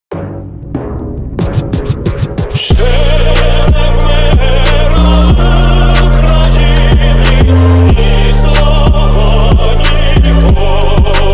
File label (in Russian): gimn ukrainy trap remix